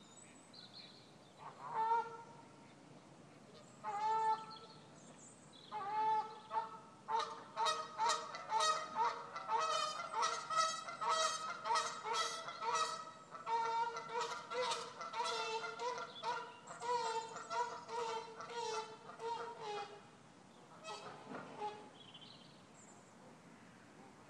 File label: canada goose